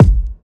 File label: rich low end tr 606 analog drum kick g sharp key, tr 606 drum machine